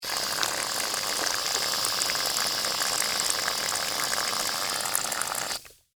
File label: Mousse Can Spray, Household, Mousse, Can, Spray; Spraying (Sound Effects:Objects); BlastWave